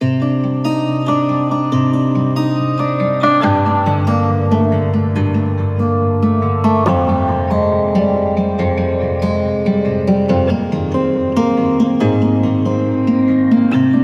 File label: Guitar - Cm 70BPM; STEMS; Ghosthack; SkyRealm; Mood Kits; Mood Kit 09 - Washed Ashore